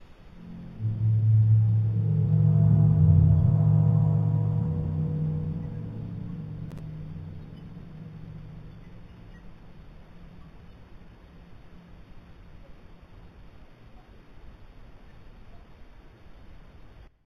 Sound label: Signal